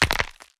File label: Bone Crack